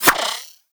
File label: Skip Rope Whoosh